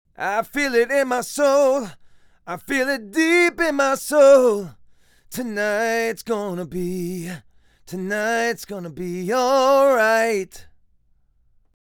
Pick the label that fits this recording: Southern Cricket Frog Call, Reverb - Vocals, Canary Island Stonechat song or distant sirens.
Reverb - Vocals